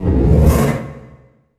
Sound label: IMP FLAME, Cinematic Impact